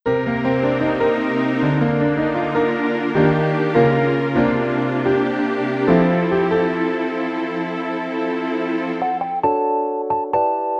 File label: Keyboard; Calliope